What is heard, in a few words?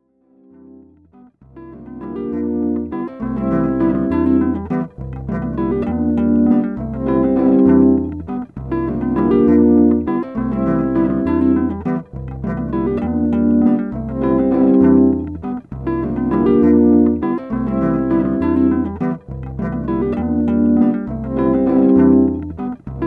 loop